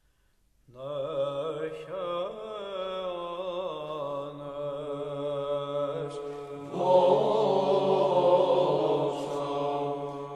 Hymnes

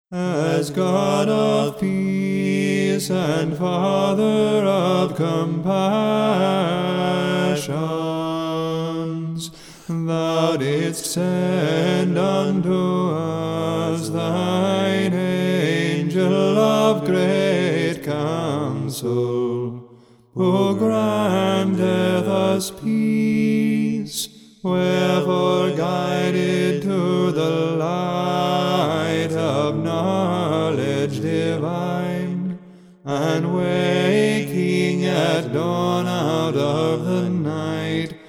Heirmos Tone I; Christ Is Born; Ode V, Canon I

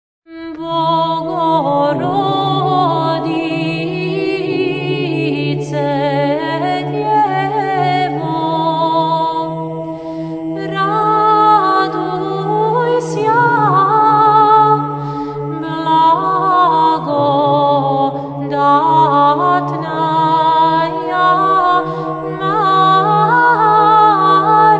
Bogorodice djevo, Byzantine chant, mode 5

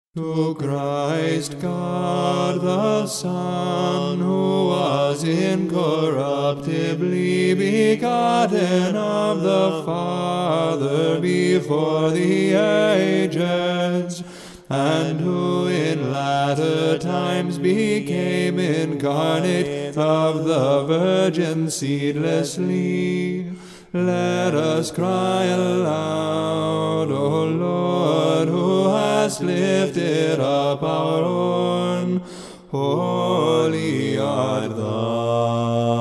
Heirmos Tone I; Christ Is Born; Ode III, Canon I

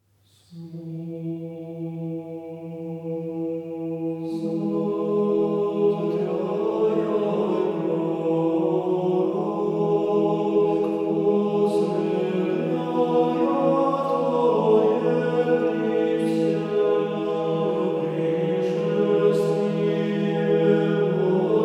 Irmos; Smotrjajaj prorok

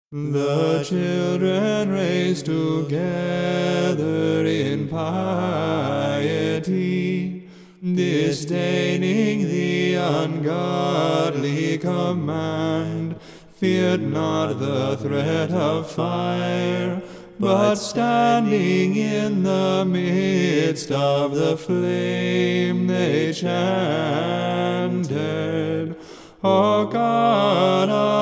Ode VII, Canon I, Tone I; Christ Is Born

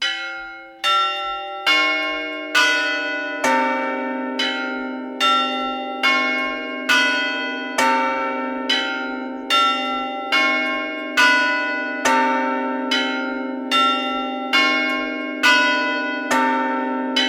Bells; Neofit Metropolitan of Russe - Bulgaria; Neofit Metropolitan of Russe - Life and Orthodox Chants; Православни песнопения

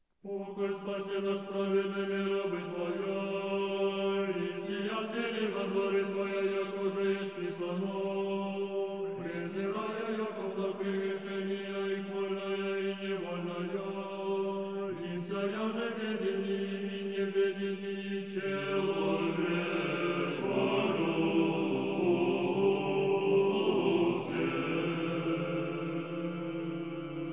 sessional hymn in tone 5

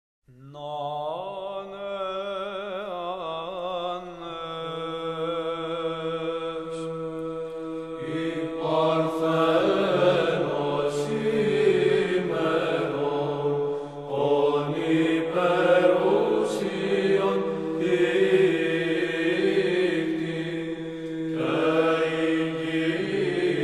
Hymnes

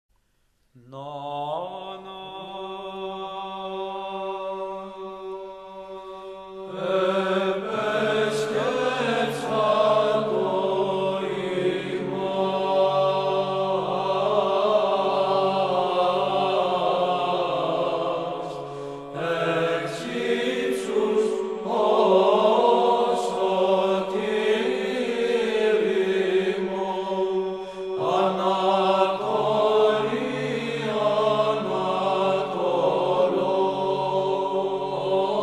Hymnes

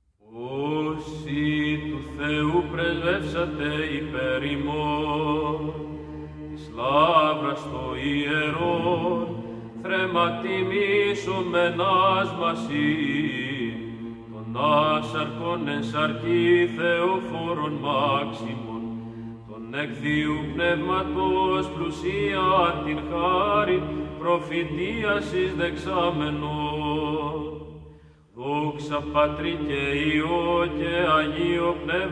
Canon agioriton osion, Mount Athos

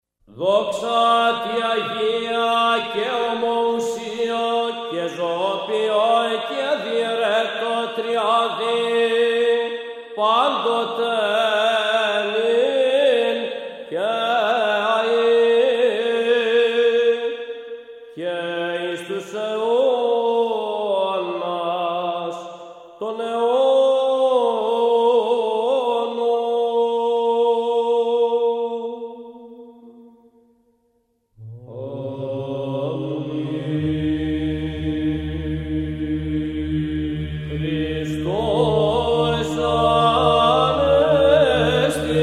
Greek Orthodox Chant; Xristos Anesti

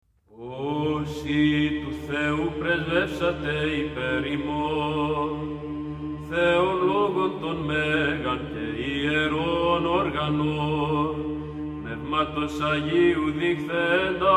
Canon agioriton osion, Mount Athos

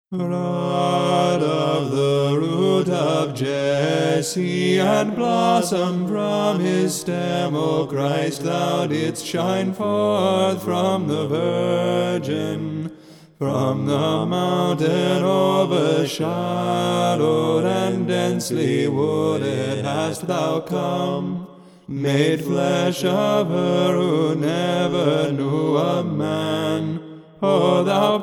Heirmos Of Ode IV, Canon I, Tone I; Christ Is Born

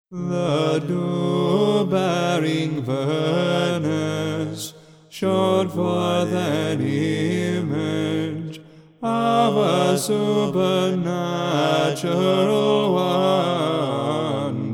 Ode, Canon, Tone I, orthodox music; Christ Is Born; Ode VIII, Canon I

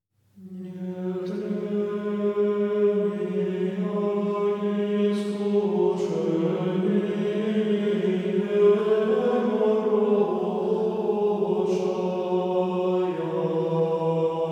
Irmos; Ne tlenija iskusheniem

